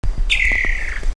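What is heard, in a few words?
Hypoedaleus guttatus churr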